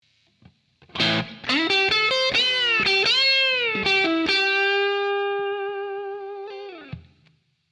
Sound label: string bend